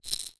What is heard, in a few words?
Rattle Shake Single, Household